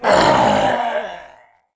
Orc Captain Die

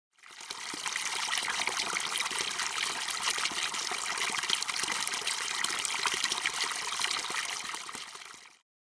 Well

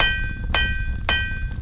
firesmith; Seer; GamigoNA

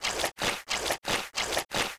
Snail run

